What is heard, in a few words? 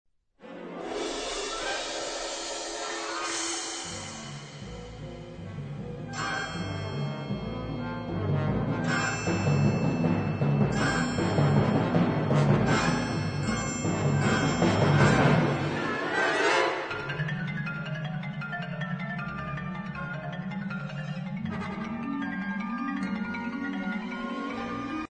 vivace percussion in concert; finale